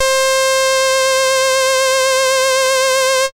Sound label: SID lead C; ChipShop Deluxe; ChipShop Hits